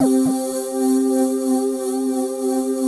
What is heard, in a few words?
SYNTHESIS; Pro-Rec - Synthsonic; JV FILM